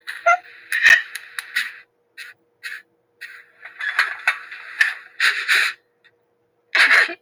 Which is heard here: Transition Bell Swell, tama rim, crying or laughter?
crying